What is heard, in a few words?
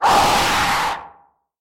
owl jumpscare sound; onaf 2